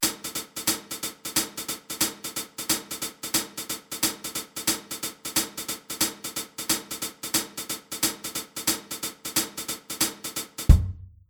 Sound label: Groove shuffle